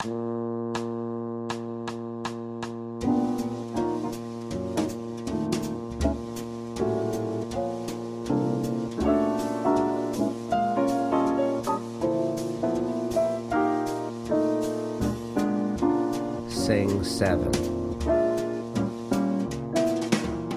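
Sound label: Bb Rhythm Piano Drone Sing Soprano; rhythm changes ear training; Applied Jazz Ear Training; Practice Perfect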